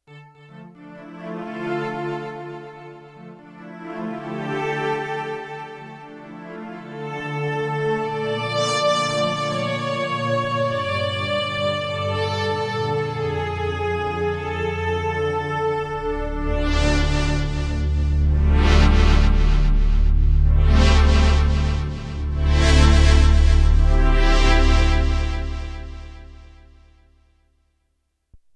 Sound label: Atmostrings, Roland VC-1